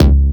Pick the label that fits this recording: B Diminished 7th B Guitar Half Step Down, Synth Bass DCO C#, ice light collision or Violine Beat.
Synth Bass DCO C#